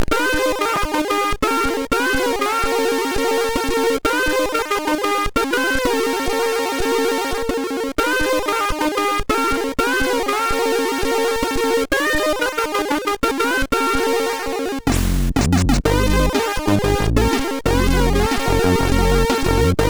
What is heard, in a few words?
Corny Jingle, sid MOS6581R4